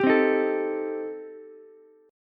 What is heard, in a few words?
A Minor 7th Flat 5th A Ukulele, chord; Am7b5 strummed; 12,12,11,12